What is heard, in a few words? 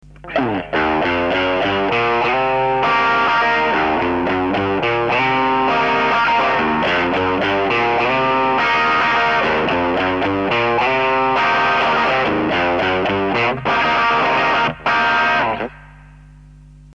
Maxon ROD880 Real Overdrive, CLEAN DRIVE, GAIN DRIVE; チューブ（12ＡＸ7）; MASTER4 GAIN5 BASS3; MID.BOOST6 TREBLE6; ＤＣ10Ｖ